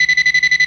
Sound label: tone seek, weapons turret; Quake 4 Alpha; id software